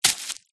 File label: foley footsteps barefoot dirt single